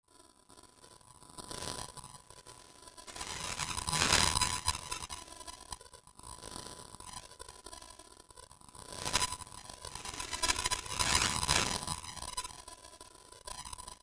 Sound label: sound design effect radio interference; sound effects one